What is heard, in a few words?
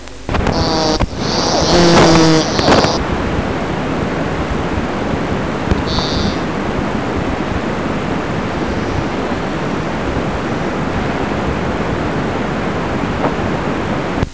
Scinax fuscomarginatus; kanguery